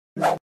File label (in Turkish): geçiş sesi efekt